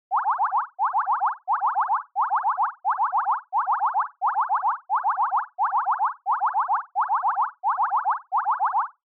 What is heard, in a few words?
Panic Fast; Onoma